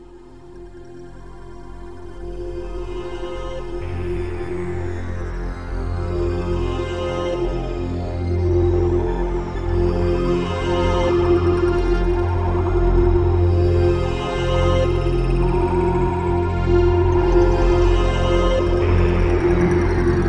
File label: dark rhythms